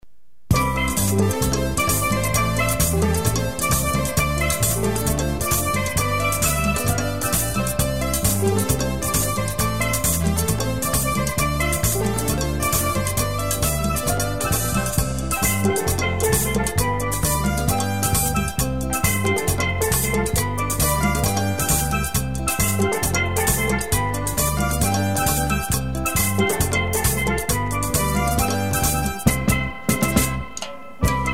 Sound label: steelpan merengue